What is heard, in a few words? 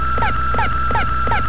sensors; nettrek